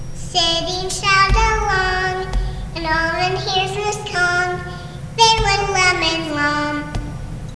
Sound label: boo singing